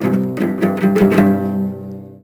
Cello Strum Badly; SFX Kit; Foley - Misc

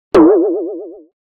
Metal Hit Pulsating Hit - Fast Pulsating; Hollywood Edge; sound designer's toolkit v2

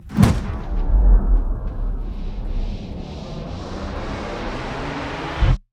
slowdown right; Pitch Black - A Detective's Demise

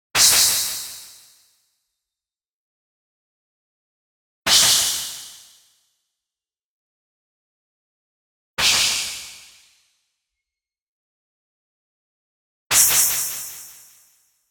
IMPACT WHITE NOISE High Pass Freq Shift Delay; boom library; Anime Essentials

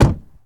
Truck Dakota Door Close; Trucks

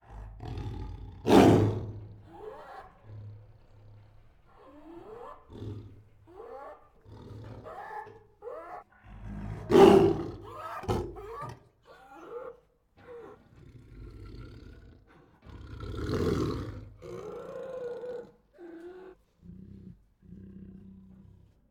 Female Tiger Angrily Breathes, Growls and Roars; Tigers; Sound Ideas; Tigers Sound Effects

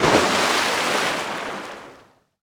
Missile Water After Splash; Destruction

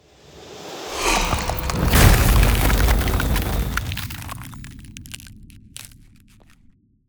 Ice Spell Ultimate Chill Of The Eternal Frost; Ultimate Ice Spells; Arctic Aura

